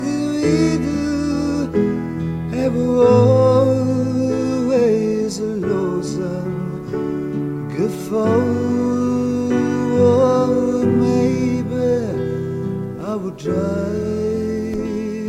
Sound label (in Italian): Provino in inglese maccheronico